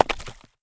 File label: Log Dirt Hit; moul